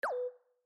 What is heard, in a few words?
beep